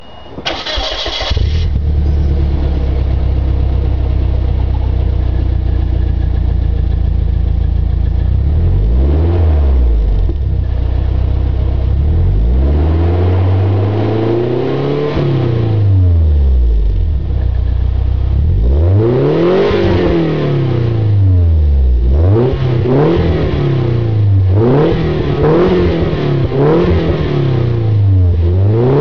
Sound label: Exhaust; Silvia